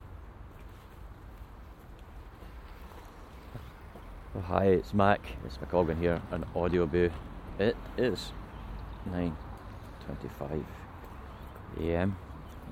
haematology appointment at st johns hospital binaural recording binaural soundscape